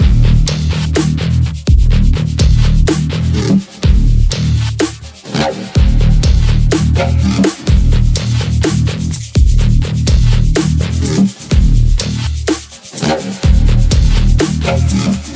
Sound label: emote music boneless; athena; left4dead2; fortnite emotes